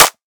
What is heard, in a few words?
claps, dance drum kit; 99Sounds x Monosounds; Twilight